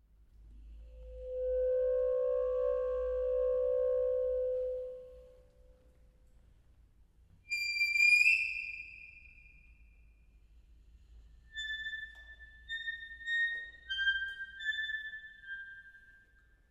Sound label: for alto saxophone solo